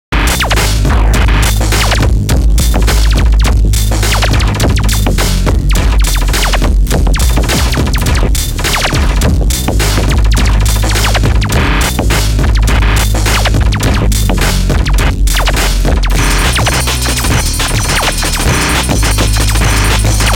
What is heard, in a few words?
drum and noise